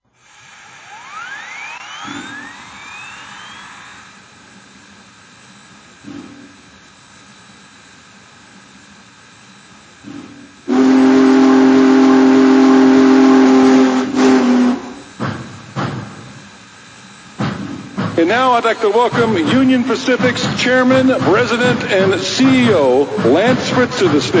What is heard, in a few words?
BigBoy; 4014